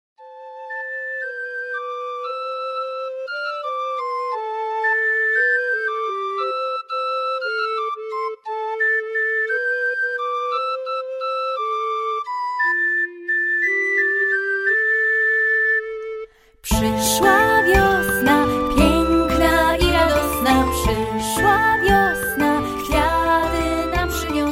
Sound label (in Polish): przyszla wiosna piekna i radosna wok